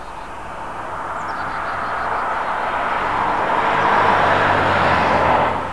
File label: car